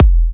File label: E kicks; markus hakala kicks